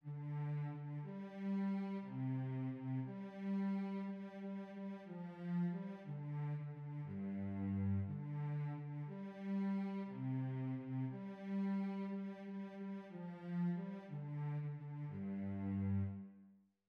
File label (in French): VIOLONCELLE